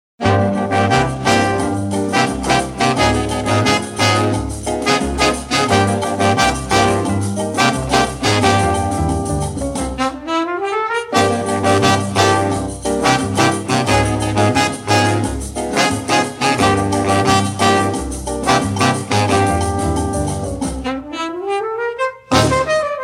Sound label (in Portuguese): orquestra; 1964